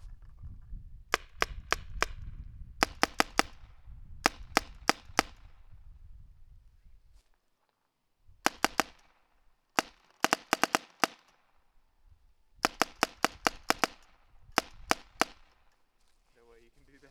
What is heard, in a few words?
spyder no clip short barrel, guns; spyder se